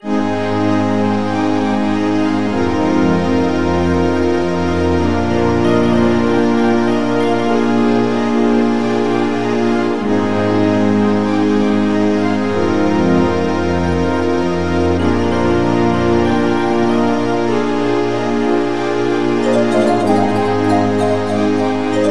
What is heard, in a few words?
Forest Interlude